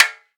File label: armor